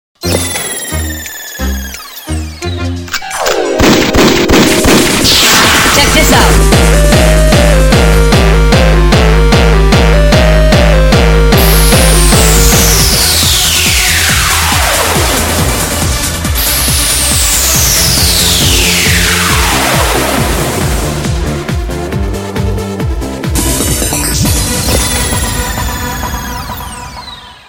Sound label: Trap Mix